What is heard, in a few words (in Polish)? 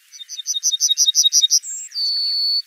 trznadel; aves